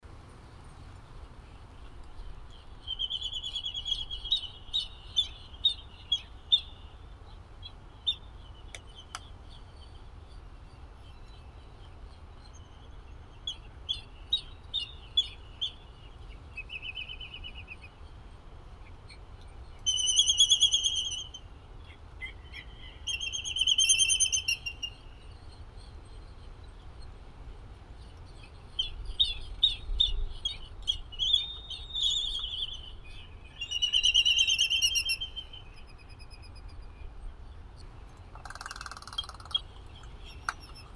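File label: Laysan albatross, Phoebastria immutabilis; albatros temnospinnii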